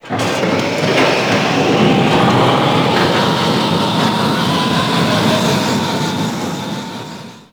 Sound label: Void Dragon Orb Charge Riser; Enemies; Abilities; Warframe; Duviri